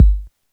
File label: FX Low